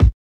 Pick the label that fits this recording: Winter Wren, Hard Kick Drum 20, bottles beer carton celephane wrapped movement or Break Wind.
Hard Kick Drum 20